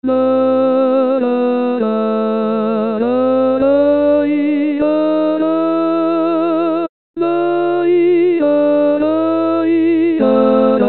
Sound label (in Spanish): introducción tenor